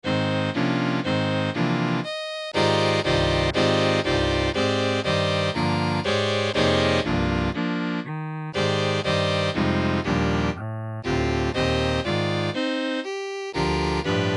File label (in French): Violon